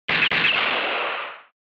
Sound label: Retro Anime Gun High